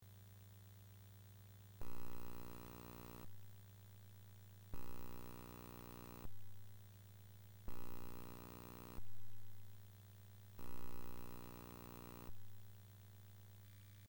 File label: noise